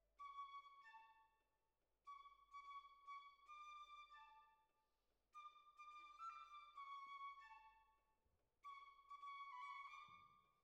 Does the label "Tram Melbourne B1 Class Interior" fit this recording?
No